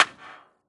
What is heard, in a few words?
Sfx Baseball